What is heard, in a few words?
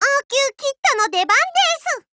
reload intense